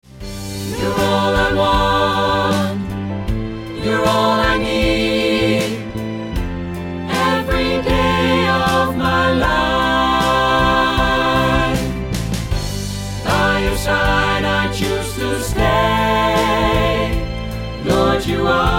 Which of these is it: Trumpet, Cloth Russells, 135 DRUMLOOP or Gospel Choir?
Gospel Choir